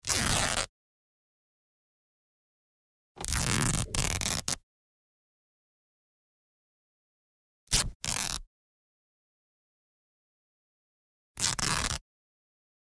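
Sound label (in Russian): звук ткани футболка разрывают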